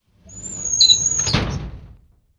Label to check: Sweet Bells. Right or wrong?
Wrong